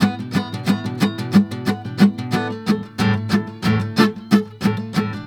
GUITAR, Balkanish Traditions

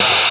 FUZZ CLAP, Drums; Distored Reality 1&2

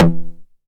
909 SD Tape Sat

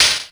Closed Hat, Ensoniq ASR-X Pro

